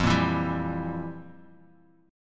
F Major 7th Suspended 2nd Suspended 4th, F, down, Bass Standard; FM7sus2sus4 strummed; JGuitar